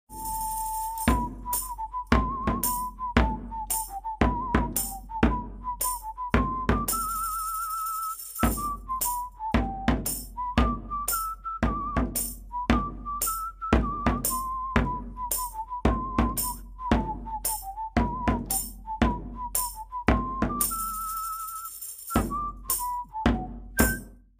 Jolly Good Fellow Drum; Shanty; Drum Variant; Sea of Thieves

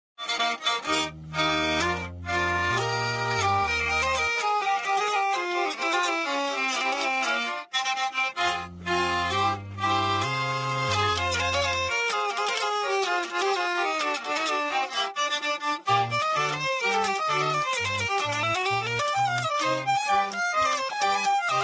1812 Hurdy; Hurdy Gurdy Variant; Sea of Thieves; 1812 Overture Shanty

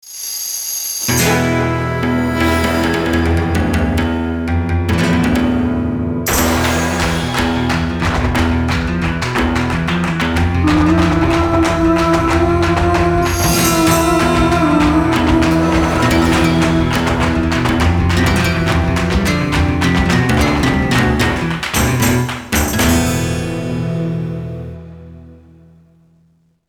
Romance Dance; Buzzenge

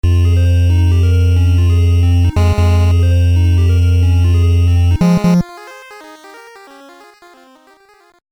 Game Over; Digger T Rock

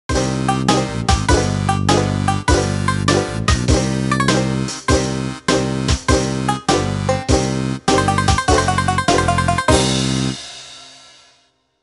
Game Over; Banjo-Tooie

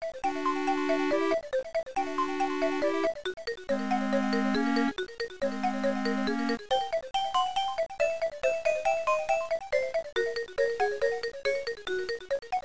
Underwater

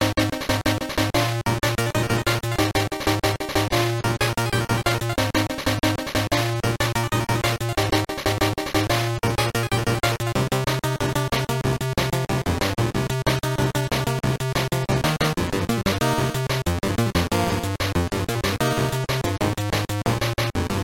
Stage; Battletoads & Double Dragon

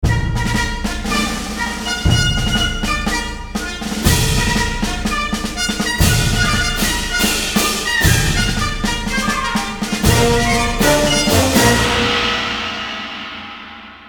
Romance Dance; Raisant

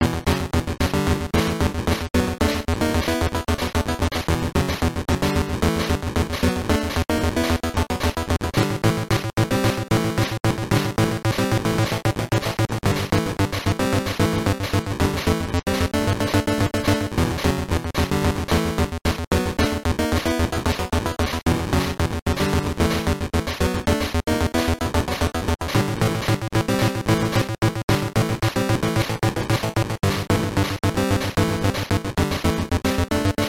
Intros; Battletoads & Double Dragon

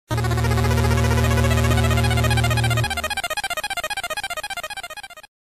Game Over

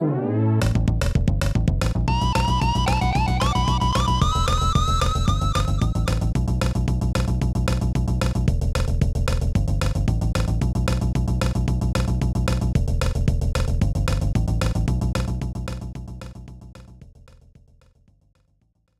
Victory; Diddy's Kong Quest; Haunted Chase; Dixie

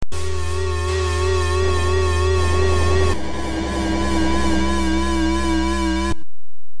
game over

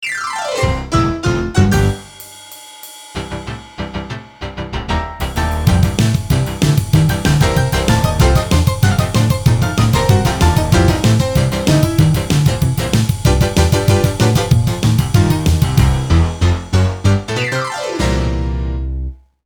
Romance Dance; Barkbark

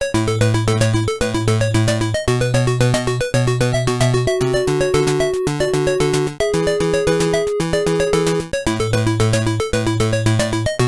Enter Initials; Cobra Triangle

